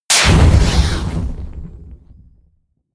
burst

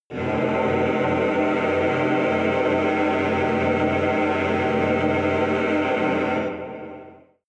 evil choir chord dissonant

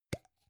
gum plastic box open soft

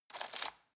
cutlery plastic drawer grab

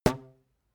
rubber band pluck short

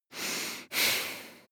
breath female nose in out

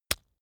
leather impact mud hard

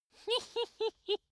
dwarf laugh sneaky soft

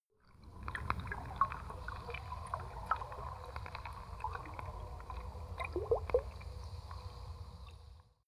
sea

